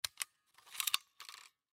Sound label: Staple Gun Open; Staple Guns; Machines; Industry; Sound Effects:Machines; Blastwave